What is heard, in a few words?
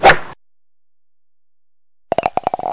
Golf Hole